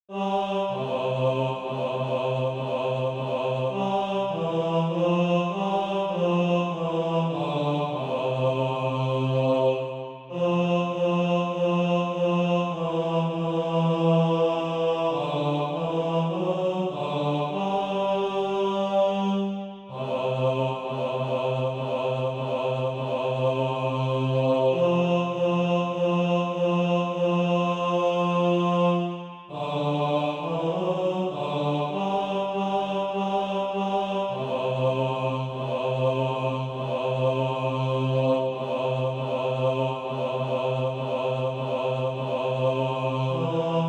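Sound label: bass, Practise Music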